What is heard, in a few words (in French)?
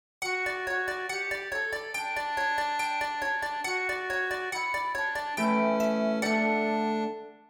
Psaume HARPE